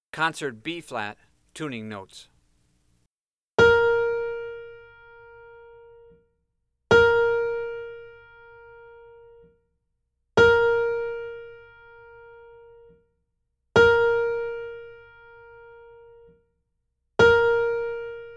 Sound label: Tuning Notes; A New Approach To Jazz Improvisation – How To Play Jazz And Improvise